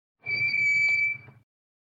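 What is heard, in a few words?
brake pads squeaking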